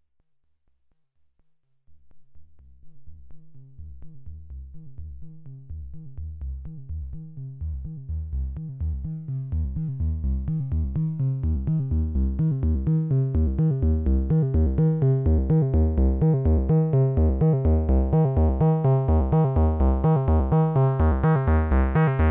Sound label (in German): ERICA SYNTHS Black System Klassik VCO VCF VCA LP und HP und Resonanz